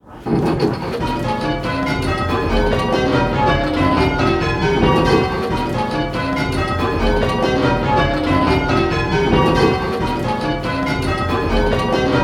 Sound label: Striking 10-Bell Call Changes; Pebworth